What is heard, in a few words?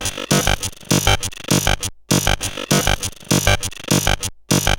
Bent RX17 (100BPM), cyborg beats; musicradar